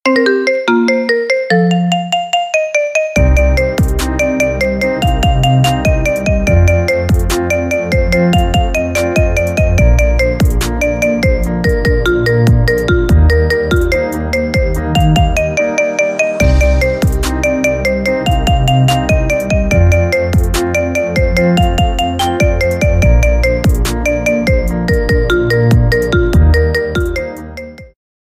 marimba remix ringtone

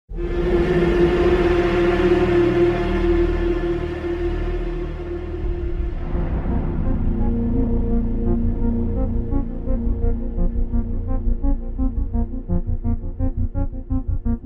ringtone; assassins unity

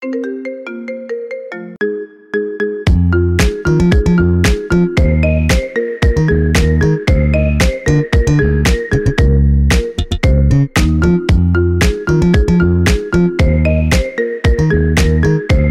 marimba remix ringtone

